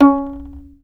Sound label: fiddle pizz C#4 L; Sampleheads; Whole Lotta Country 1 & 2